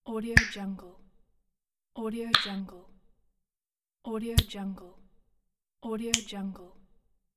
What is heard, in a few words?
flipping fingers